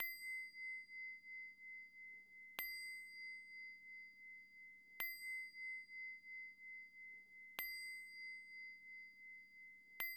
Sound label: small bowl int weak rep